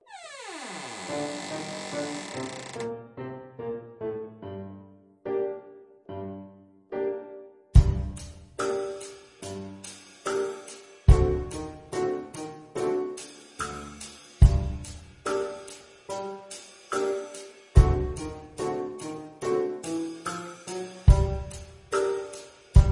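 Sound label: Creeping about accompaniment; Hey Presto for viola bk 1 bronze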